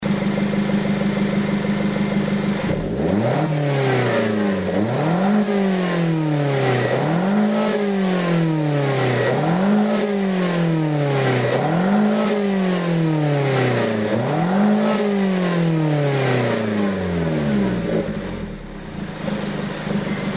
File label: Mazda2 Demio DJ, Muffler; AutoExe